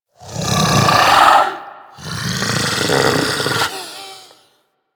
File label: Vocal Snore; Warframe; Hunting Critters; Orokin Kubrow